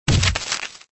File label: Paper Impact